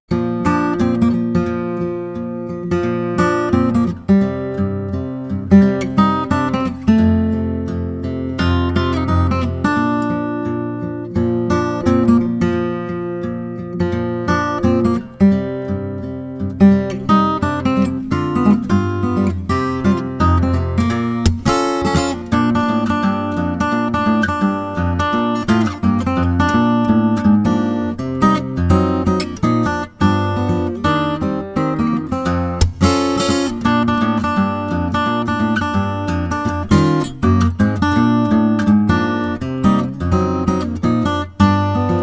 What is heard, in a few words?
jingle; XMAS